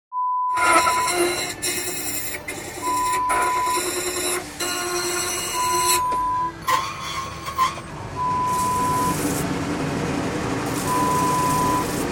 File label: Key Cutting sound